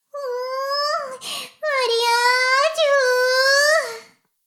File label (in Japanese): フェラ音声; 古き城のドラゴニート2